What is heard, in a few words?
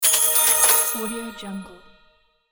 cash machine prize